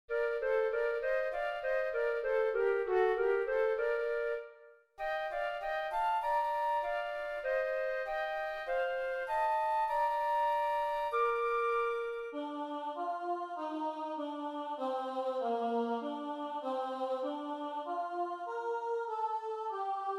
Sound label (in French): FLUTE; PriereDeFrancois